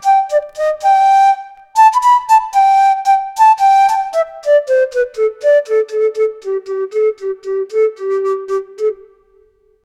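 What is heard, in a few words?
flute melody, indian; radha krishna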